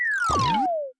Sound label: wrong answer